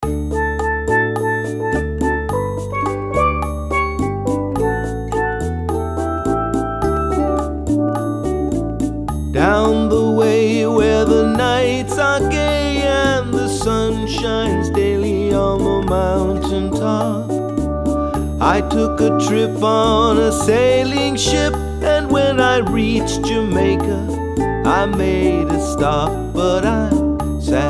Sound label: Caribbean Band; Los Angeles Caribbean Band